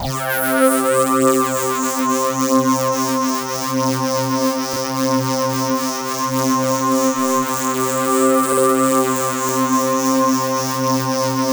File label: ring sweep, ring mod; Spectrasonic Distorted Reality 1